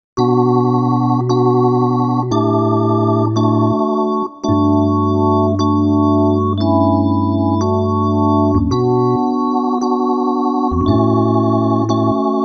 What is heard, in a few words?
Draw Organ